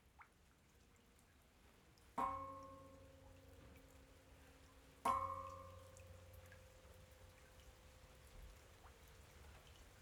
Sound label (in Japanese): 雨の寺院環境音。寺社環境音; 「かぼちゃ寺参拝と音探しの始まり」